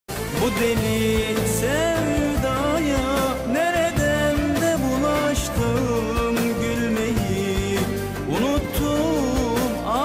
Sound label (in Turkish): telefon zil sesi